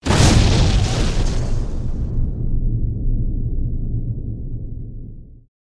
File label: Massive explosion close perspective